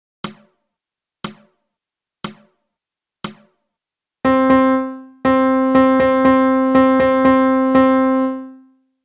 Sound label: Melody; Lesson 3 Easy